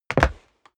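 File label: step dress boots wood parquet jump, footsteps; schritte; menschen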